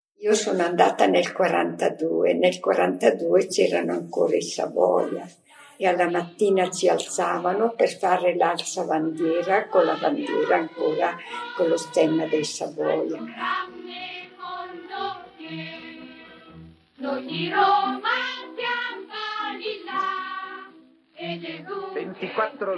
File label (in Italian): Alzabandiera; Brescia